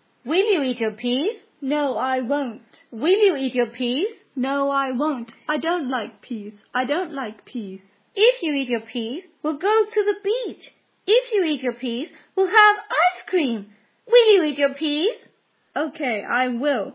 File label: chant; unit8; year6